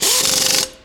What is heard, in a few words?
air wrench long; vcmod